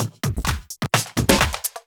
Houseloops 128BPM; Vengeance Samples

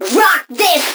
Vocal Phrases; Vengeance Samples; Vocals

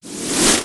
Short Fills & Reverse; Vengeance Samples

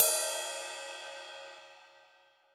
Ride, Cymbals

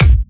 Kicks; Vengeance Samples

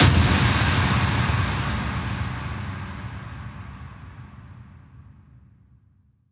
Impacts

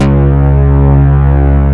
Vengeance Ultimate Bass C#, Root C#

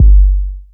Bass Drop, 808; Street Anthems Sound Kit

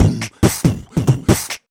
Beatbox Loops, Human Beatbox

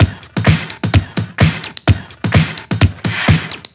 Housebeats, 128BPM; Vengeance Samples; VEH2 Loops

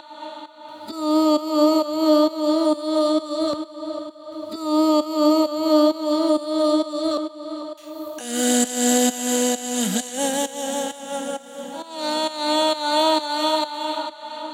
Vocal WET, 132BPM, G# major; Vengeance Samples; Jericho Kit

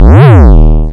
VFX2 Sub

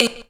Synth Shots; Vengeance Samples

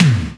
Tom, Percussion; Vengeance Samples

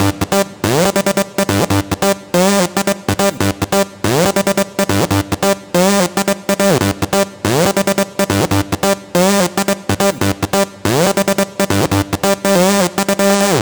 Root G 140 BPM; Vengeance Samples; Special Sounds

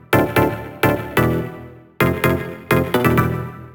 128BPM Air Raid Melody; VFH1 128BPM Air Raid Kit

